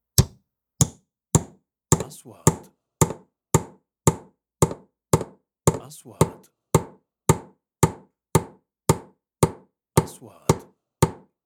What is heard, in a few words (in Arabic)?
مطرقة; sound effect